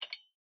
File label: Tool; Game rip; MiSide